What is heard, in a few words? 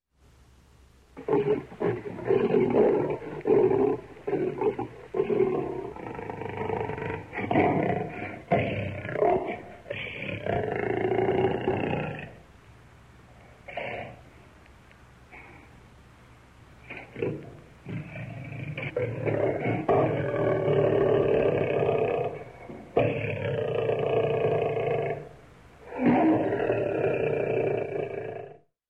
Big cat bellowing menacingly